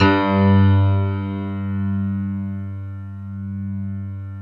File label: G1, PNO; Northstar - New Gold VOL-1; HLYWD PNO